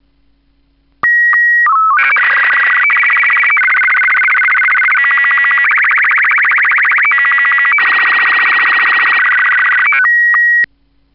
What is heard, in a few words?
test card; sstv